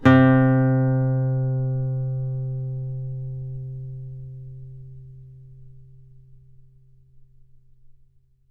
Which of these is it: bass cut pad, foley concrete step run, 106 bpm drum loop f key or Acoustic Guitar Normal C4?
Acoustic Guitar Normal C4